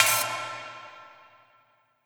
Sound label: perc, Drum & Bass, 170 BPM; Ueberschall; Drum & Bass Flash Trackz